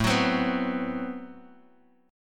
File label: A Minor 6th Add 9th A Bass Standard, Chord; Am6add9 strummed; 17,21,22,16; JGuitar